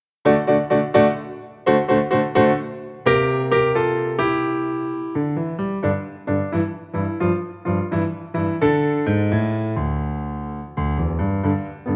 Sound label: Groovy Blues